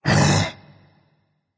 seriously berserker; cuchulainn

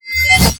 swing; smite; heimdallr

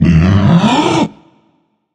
nv breath, vox; smite; voicepack; vulcan

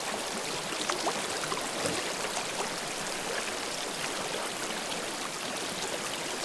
ambience water trickle pool; smite; conquest

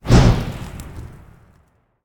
swing; smite; guanyu

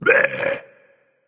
tongue, voice; Smite; ymir skin14

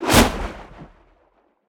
flame whoosh; Smite; Sol

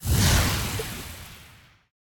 rocket tail; smite; vulcan

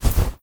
movement armor; sobek; smite

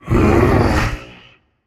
ugh, voicepack; smite; jorm

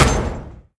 shield hit; smite; Ares

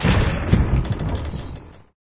lobby landing; smite; chronos

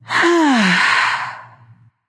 sigh; smite; nemesis

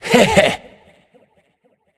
hehheh; smite; tiamat

